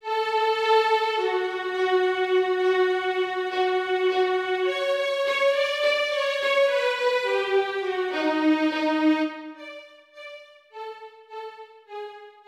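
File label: Bartok For Children Violin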